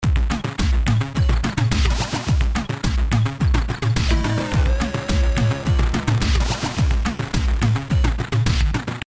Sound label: Arcade Minigame Before Start Music Loop; Eotm